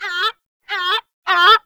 Forest Animal Cries; Warframe; Grineer Forest

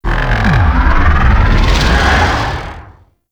Laser Pre Stinger; Intro Battlefield

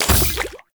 Crafting Equip; Deimos

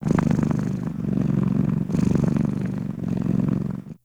Kitten Purr; Enemies; Warframe; Catbrow

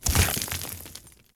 Horse Surface Kickup Dirt; Warframe; Duviri Horse; Vehicles

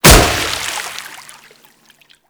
Staff Impact Water; Warframe; Weapons; Impacts

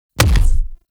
Punch; Warframe; The New War; Weapons; Omicrus

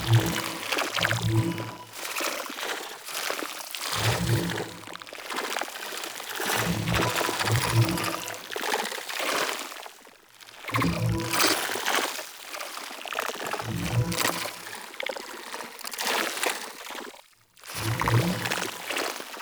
Mushroom Loop; Warframe; Nokko Colony; Ambience

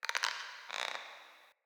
Cork Creak; Puzzles; Neathuns

